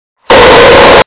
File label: loud screamer ACTUAL SCREAM; HEADPHOND WARING